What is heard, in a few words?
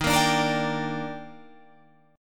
Eb Major 7th, Guitar Drop D